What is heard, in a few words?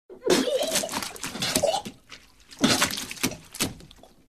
Human Vomit; Hollywood Edge Foley Sound Library